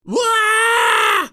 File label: daddy scream